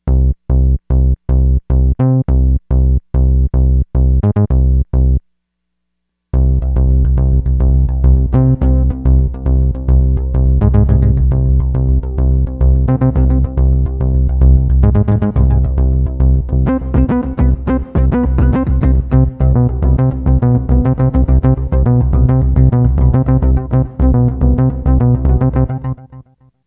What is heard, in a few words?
FLANGED ECHOES